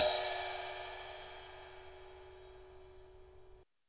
RIDE, Drums Acoustic; USB Soundscan vol.10; AKAI; ALL RIDE

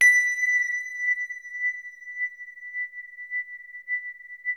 GLAS H4, ATMOSPHERICS; Music Store - Claudius Diemeter Schwerlos

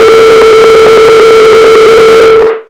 RADIO FX, Hard & Loud Techno; USB Soundscan; AKAI

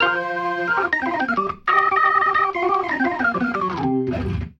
RIFF, ORGAN RIFFS; InVision Interactive

